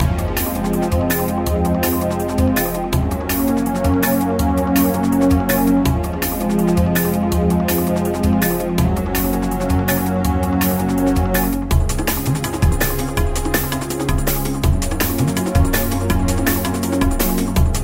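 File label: penta d Maj7 backing track; scales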